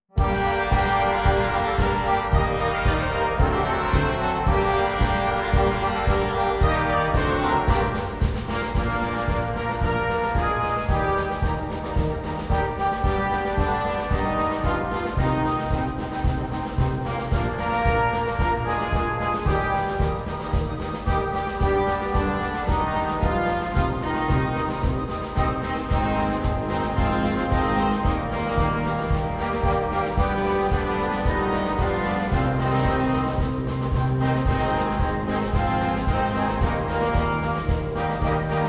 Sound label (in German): Polka Polka Polka